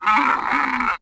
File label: Camel Die Vox; Civ4 warlords